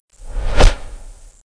SWORD; Renaissance

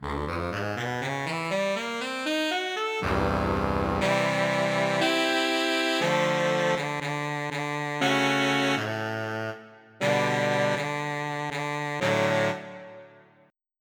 Hard Vibrato, altosaxs; SoundFont - Traditional, Voice & Keys - Volume 2

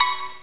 Cowbell Reverb; Amiga-CD 1996 #5-6; sampler; drums

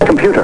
computer

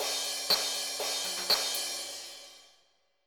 new age

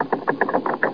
turn

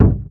updown, menu; PC Action 1998 December

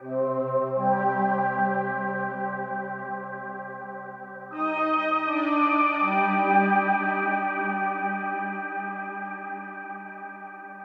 SYNTH PAD AMBNT 160 C; Ambient; Sphere

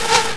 arrow (miss); Odyssey Demo

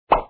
pop

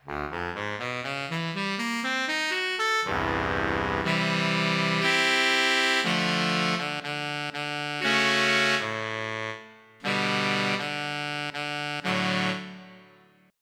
Crisp Sax; SoundFont - Traditional, Voice & Keys - Volume 2; tenrsaxs

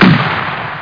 gun blast!; charley cat

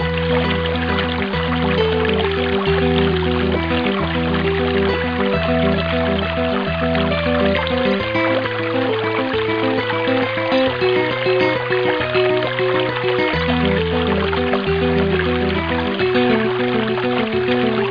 STATIC

